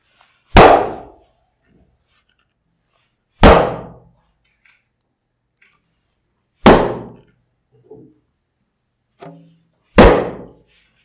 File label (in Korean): 멍